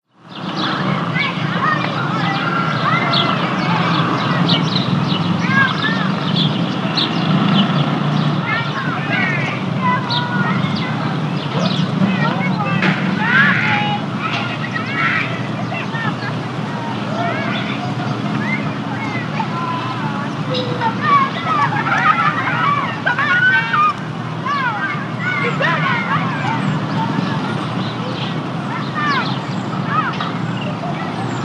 TRAFFIC SUBURB: Kids playing, distant sparrows, traffic rumble in background.